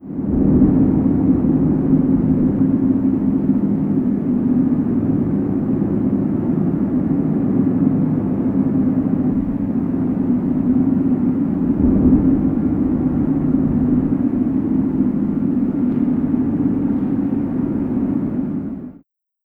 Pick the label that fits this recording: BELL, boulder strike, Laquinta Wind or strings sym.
Laquinta Wind